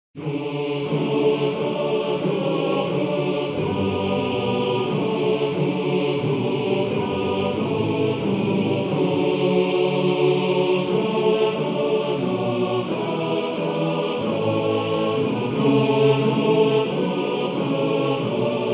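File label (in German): Motette Chor, Männerchor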